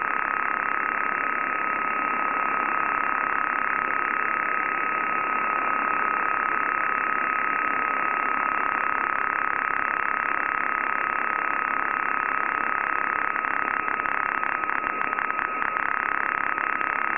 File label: Iranian later OTH Radar on 14 and 21 MHz